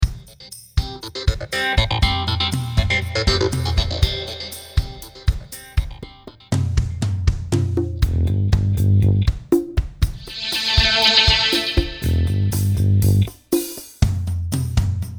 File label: bass